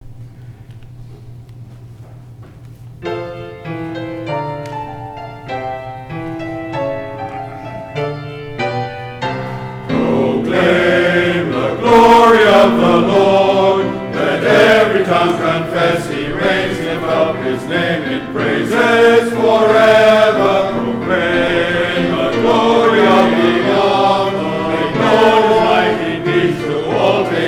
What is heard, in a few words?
choir anthem introit; fathers choir